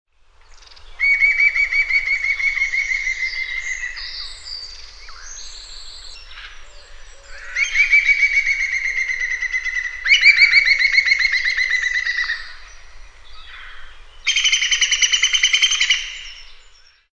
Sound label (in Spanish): pito real